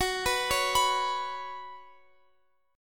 Gb Suspended 4th Gb, down, Guitar, Open F; Gbsus4 arpeggiated

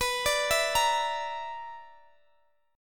B-Minor Major 7th Double Flat 5th, Guitar Open D

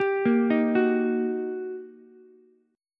G Minor Major 7th Sharp 5th, down, Ukulele; GmM7#5 arpeggiated; JGuitar

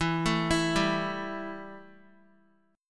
E Minor Double Flat 5th E Guitar; Embb5 arpeggiated; x,7,9,9,7,x

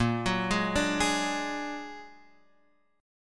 Bb Augmented 7th Bb down Guitar Drop D

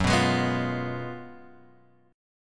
F sharp Major 7th Suspended 2nd Suspended 4th; F#M7sus2sus4 Chord {2 2 X 1 0 1} strummed

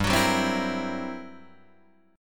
G Minor Major 13th, G, Guitar, DADGAD; GmM13; strummed

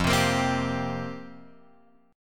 F Suspended 2nd Suspended 4th F; Fsus2sus4 Chord {1 3 X 3 1 3} strummed

